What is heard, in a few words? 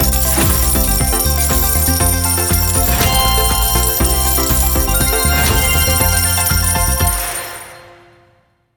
superwin; Burning Stars; wazdan